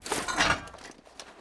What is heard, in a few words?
open rear hatch; Rust